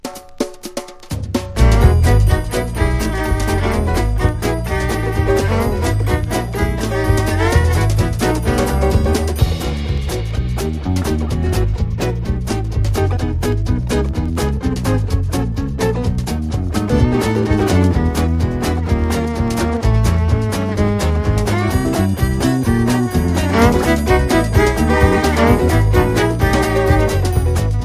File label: JAZZ INSTRUMENTAL